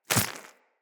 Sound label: Inf Small Walk Default, Footsteps; Infested